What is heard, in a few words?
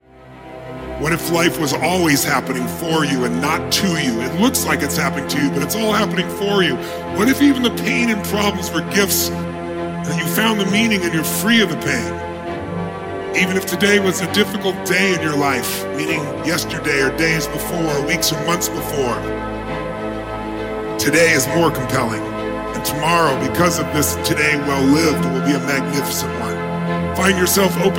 priming with breathwork; ignite